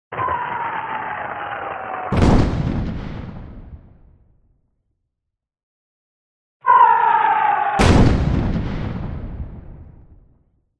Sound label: Whistle and projectile explosion; explosions